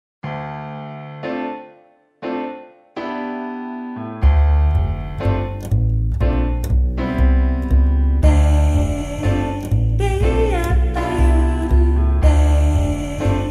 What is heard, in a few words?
Blue Jazz; filmmusik